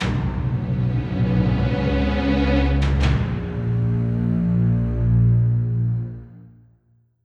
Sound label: Death STRINGS